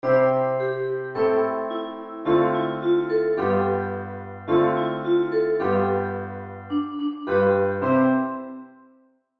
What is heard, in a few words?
melody